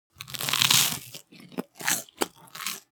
foley eat toast short; smartsoundfx

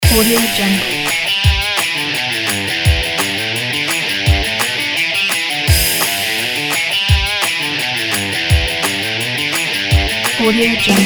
classic rock; dsvmusic

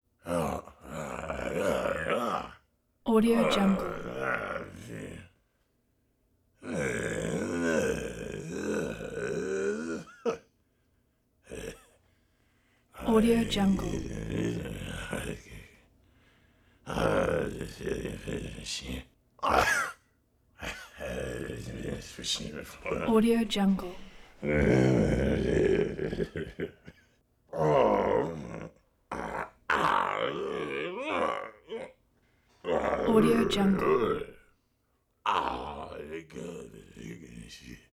beast voice angry growls mumbles grumbles; prosoundfx

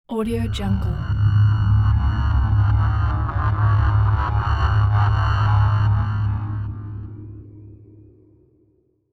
space drone

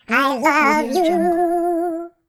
cartoon singing love chant

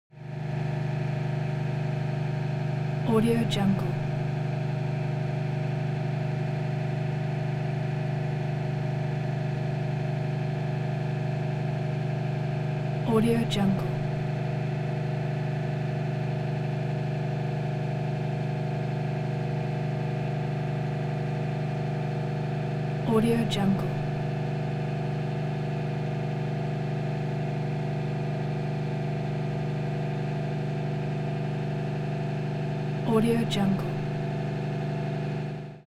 transportation car camaro high rpm exhaust

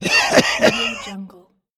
smoker cough

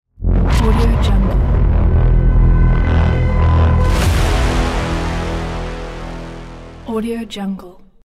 futuristic intro ident

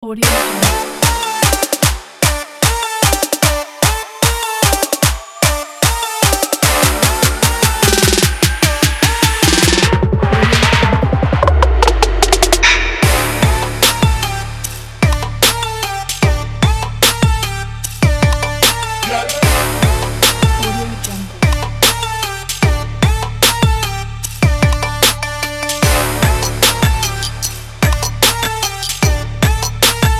trap sport

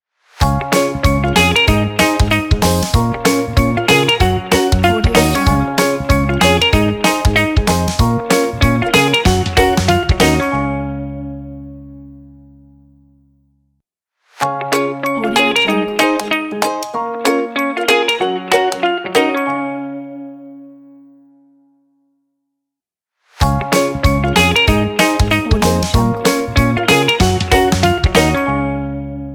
fun joyful guitar intro logo

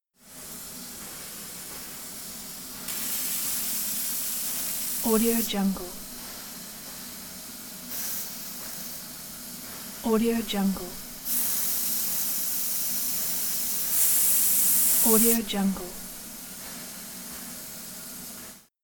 train steam release

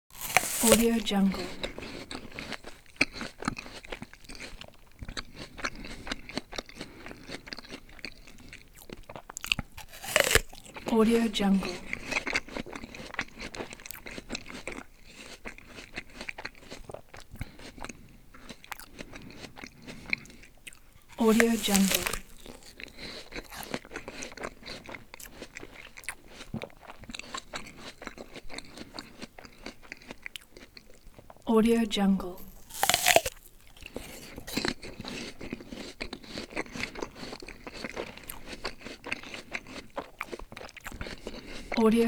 eating apple

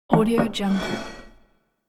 palace plates moved on table

